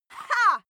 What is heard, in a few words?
female cheer hah; schreie; menschen